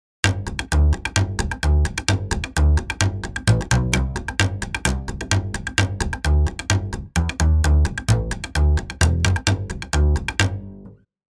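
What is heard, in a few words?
roots urban slap palm flick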